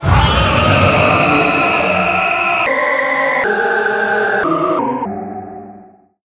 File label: worm die; npc; svencoop; morass beta